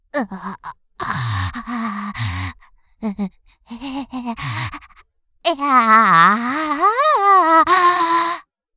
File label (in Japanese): 痙攣; 失神中